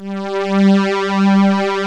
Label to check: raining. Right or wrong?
Wrong